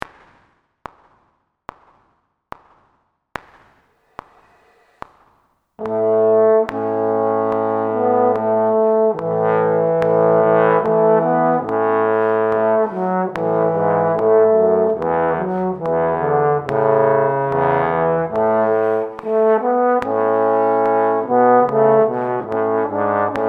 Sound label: Maj A, trombone; The Tone Ladder